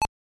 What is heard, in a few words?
piece set; tetri5